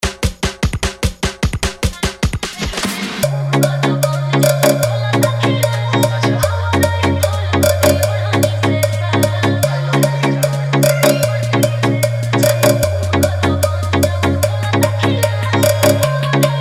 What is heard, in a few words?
Bhojpuri Song Edm Drop Mix